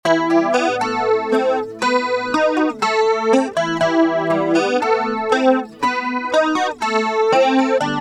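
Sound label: acoustic guitar mistress original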